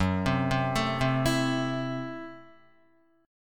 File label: Gb Minor 7th Flat 5th, Guitar; arpeggiated; 4F,4A,5C,5G,5C,6E; JGuitar